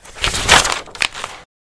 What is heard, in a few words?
page flipping